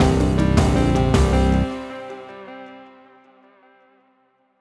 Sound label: Wasteland Loop End; Folk Hero